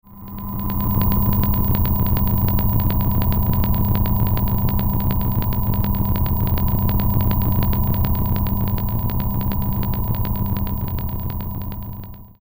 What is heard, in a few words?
Eerie Static Crackle Swell; Warped